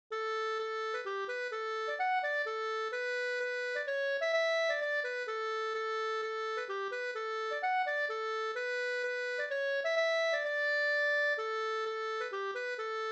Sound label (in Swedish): hamburska; Kavelbrolaten e Anders Jansson e Lille Gustaf